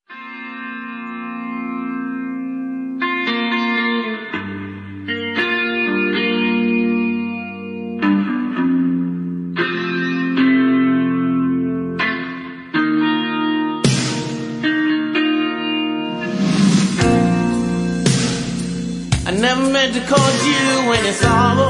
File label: Backing Tracks